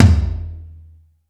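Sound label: POWER KITS, tom; 90 sSampleCDs; Crypton - DrumBase5000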